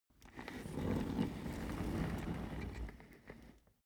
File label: office chair trundle slow long